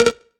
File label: Synth Shot A#; Mutekki Media; Vengeance Electroshock Vol.2